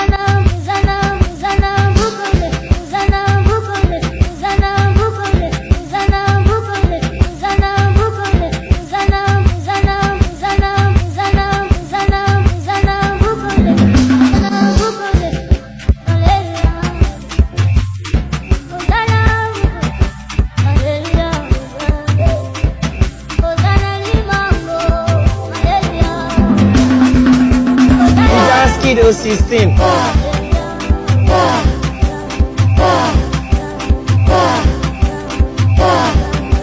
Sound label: Hossana Dance Beat